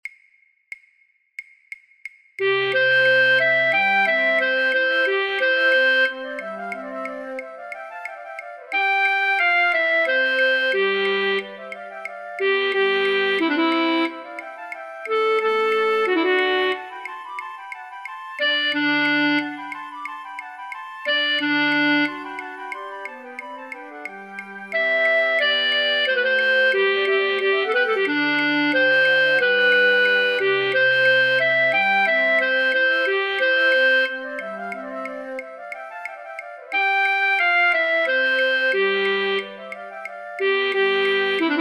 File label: flute choir fluit; rameau; platee; voor oefentracks